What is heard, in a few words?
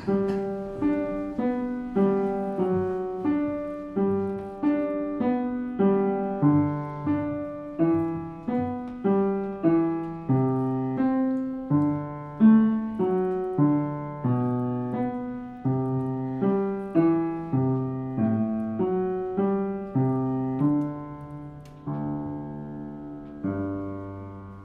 Bass line